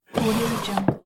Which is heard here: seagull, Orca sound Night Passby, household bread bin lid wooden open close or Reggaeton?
household bread bin lid wooden open close